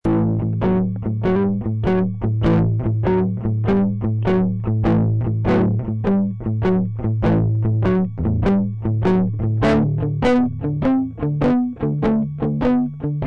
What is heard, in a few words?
backing